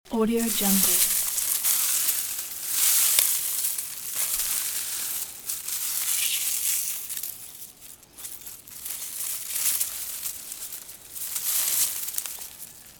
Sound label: dry rye grain rustling; fxprosound